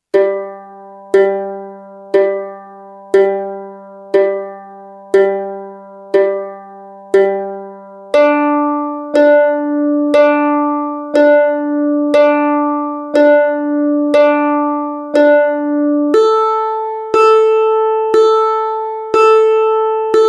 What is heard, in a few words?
Mandoline G D A E